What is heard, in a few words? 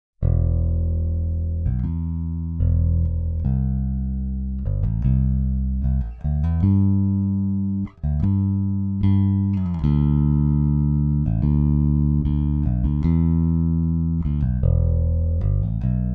adb bass